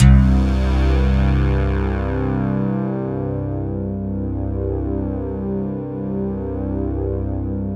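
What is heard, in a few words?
JV heaven guitar, JV-1080; Syntec; Wall of Sounds VOL-2; BASIC-JV1080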